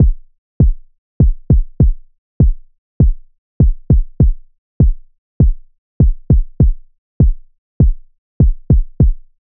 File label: Drum Loop - 100 BPM Kick, Afrobeats; Loop Stems; Cymatics; PARADOX II - Drum Loop Collection; Duppy Drum Loop